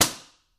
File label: Book Slam Slap Good